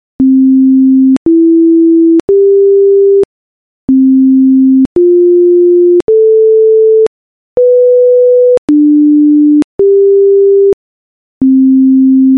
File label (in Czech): Akordy C dur postupne